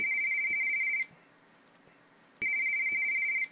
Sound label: motorola dynatac 8000 ringtone